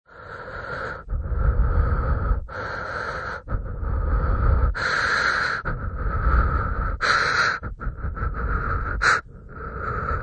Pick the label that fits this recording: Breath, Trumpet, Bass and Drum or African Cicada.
Breath